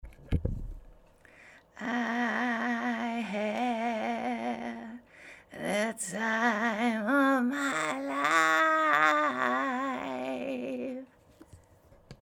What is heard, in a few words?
Creaking